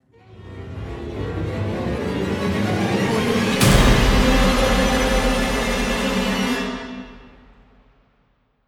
creepy game musical alert strong assault intense impact